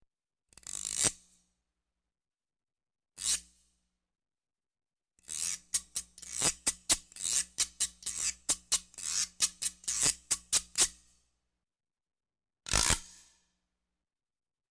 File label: Scrapes Percussion Accent ONE Scrape; Electa Quinney